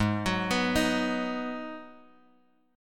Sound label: Ab Minor 7th down Guitar Drop D